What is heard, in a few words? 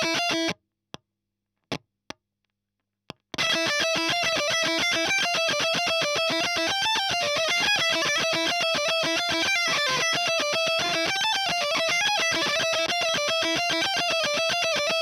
EL Fuzz End